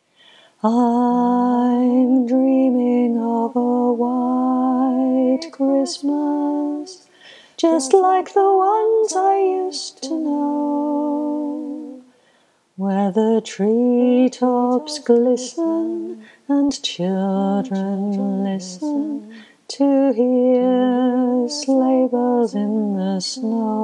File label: Tune highlighted, choir